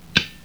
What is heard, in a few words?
CLICK ON